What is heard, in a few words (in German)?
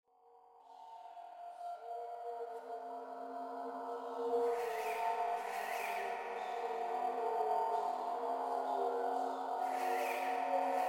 futuristische stadtatmo mit reissverschluss